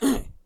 Male Hurt

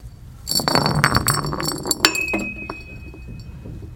Bottle rolling Stop

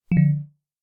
Alert

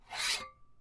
Blade Sharpen

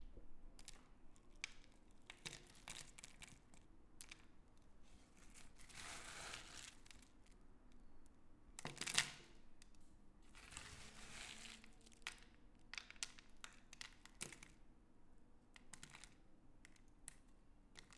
Small Rocks Falling

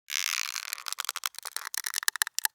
Stretch Medium

